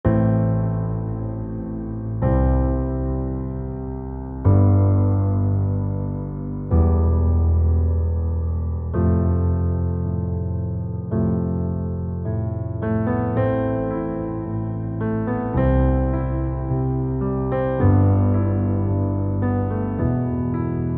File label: In the Pouring Rain Lament